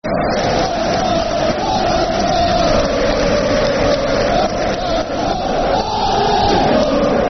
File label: Football Chant (Soccer Songs); Hartlepool; Hartlepool United Hufc